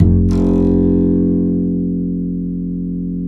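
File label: double bass F2, Jazzistic; Univers Sons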